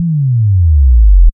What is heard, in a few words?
Sub Bass 3 Seconds